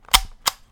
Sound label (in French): Kodak Clic Photo